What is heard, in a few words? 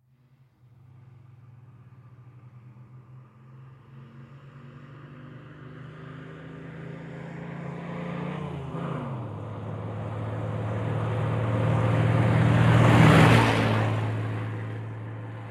Dodge Power Wagon Fire Truck, By Medium Cu, Medium Speed.